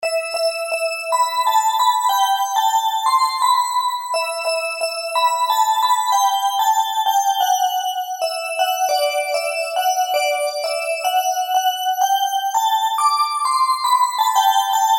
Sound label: Bells; Ddlj